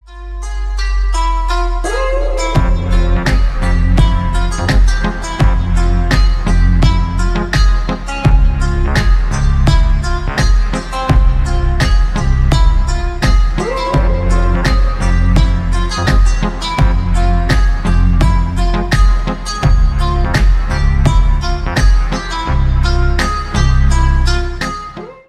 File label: slowed reverb